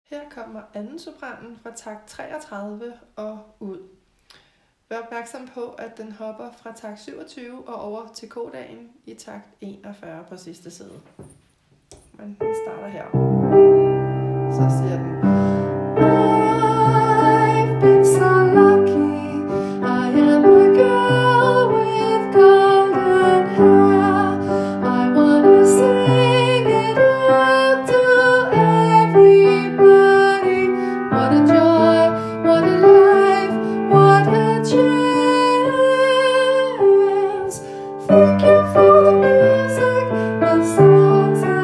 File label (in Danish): sopran; fra takt 33